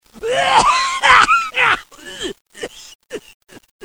Toux, Maladies; bruitage

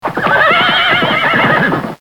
Cheval huhu, Animaux; bruitage

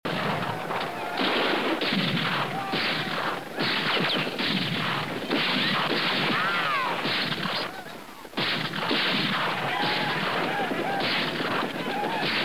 Cavalerie; bruitage; Armes

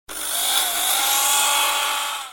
Outillage robotisé; bruitage; Outillages

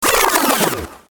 Vynil à l'envers

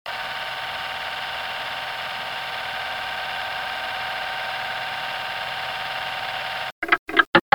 Projecteur; bruitage; Electro-menager

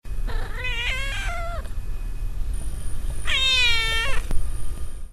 Chatte chaleur, Animaux; bruitage

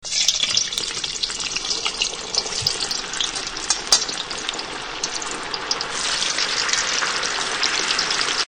Friture, Electro menager; bruitage

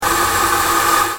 Machine à café; bruitage; Electro-menager

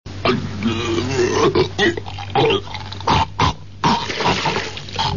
Vomissement; bruitage; Liquides

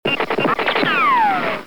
Tir avec ricochet; bruitage; Armes

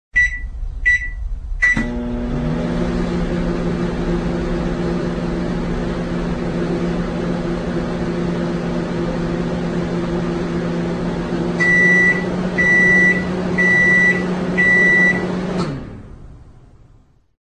Micro onde; bruitage; Electro-menager

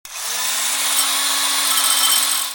Robot menager; bruitage; Electro-menager

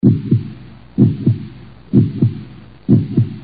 Coeur battement; bruitage

